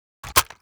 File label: pickup weapon